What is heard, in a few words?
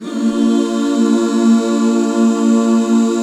BB MAJ; Best Service Gigapack 1